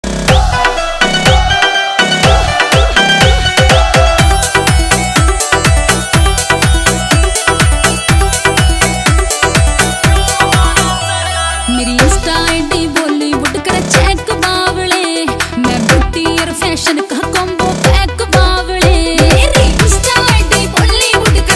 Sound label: Hindi Ringtone